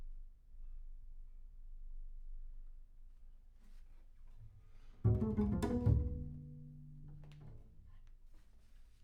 Bass Room

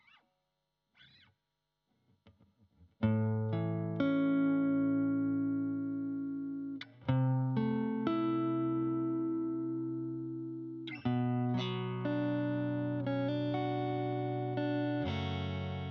GTR

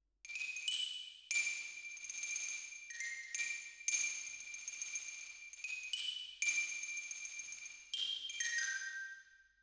Twin R Xylo

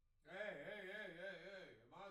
Piano B

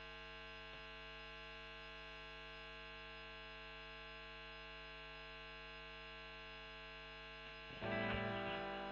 E.GTR Gefell OD

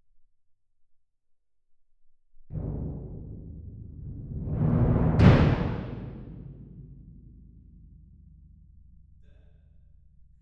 Perc

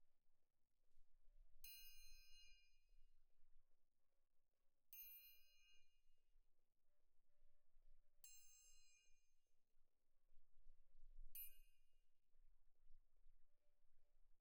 Perc Main

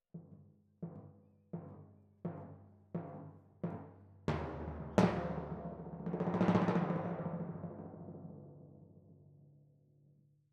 Timp Close

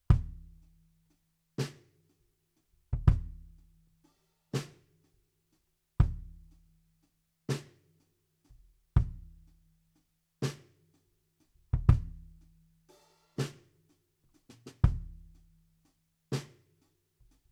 BD Out

